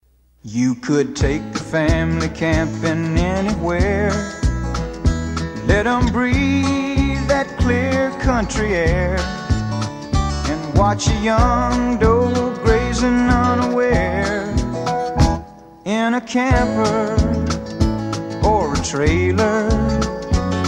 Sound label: Jingle; Gilliland Trailer Sales